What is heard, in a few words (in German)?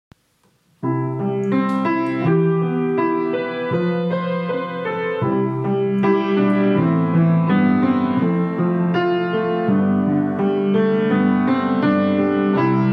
klavieremotionen